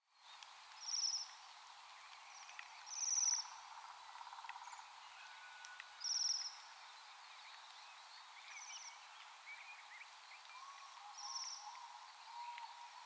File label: Bohemian waxwing; پرنده بال لاکی بوهمی